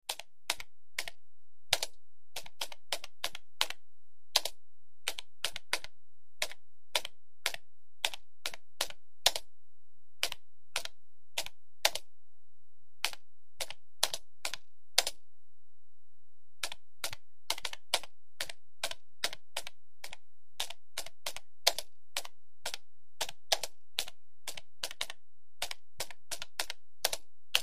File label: Laptop Keyboard Slow